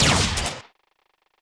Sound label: Gun; ASUS; P4B533; Intel 845E ATX